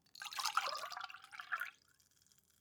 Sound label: glass pour fast; soundpool